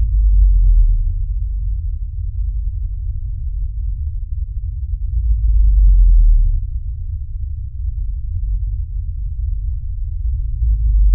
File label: Blue Whale